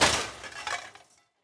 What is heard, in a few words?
metal break; teardown; ps5 windows xbox series xs